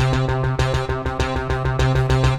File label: KEYB F1, ROCK POP, KEYBOARD; MAGIX